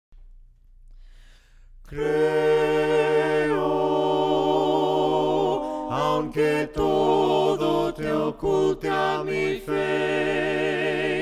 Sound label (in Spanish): VOCES, himnos a capella; cantos del camino; CREO